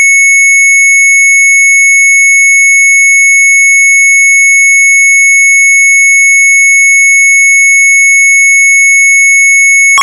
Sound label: MZ800 Tape, sharp; Massenet; retromelsarchive